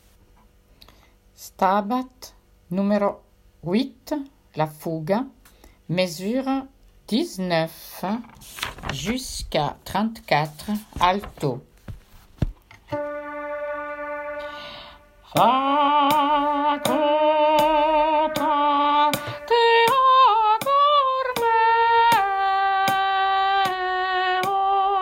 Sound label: Stabat Alto; Mesures 19 à 34